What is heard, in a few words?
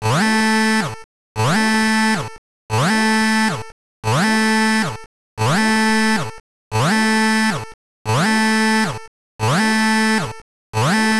Warning!; Sagaia; GB